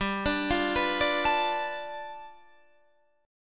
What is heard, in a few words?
G 9th, Guitar Half Step Down